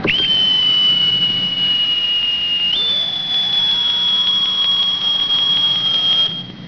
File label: WHISTLE